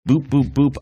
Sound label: boop boop boop